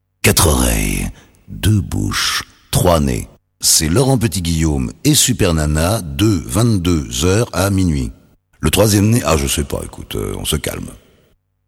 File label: jingle; dorval; supernana